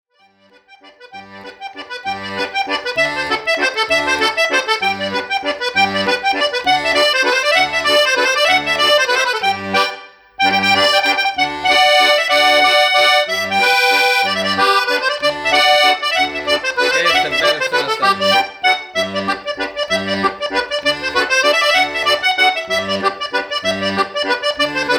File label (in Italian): mazurka